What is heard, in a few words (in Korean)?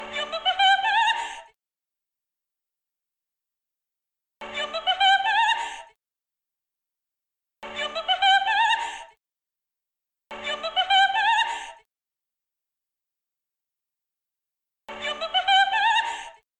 웃음소리